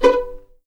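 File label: B4 pizzicato mezzo forte left, violins; East West; The Ultimate String Collection; VLNS PIZZ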